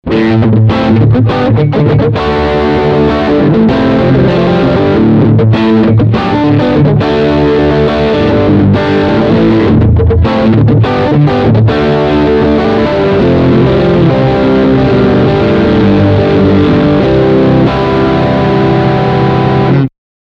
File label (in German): Front und Middle Singlecoil